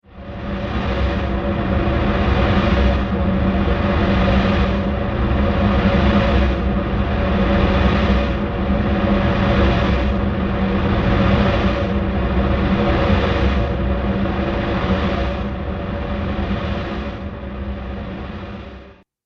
Science fiction Power Generator Ambience

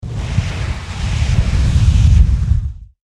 Fire Flame Whoosh; Flames

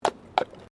Tram Horse Drawn Horse Step Pavement; Horse drawn tramcar; Carriages; Vehicles

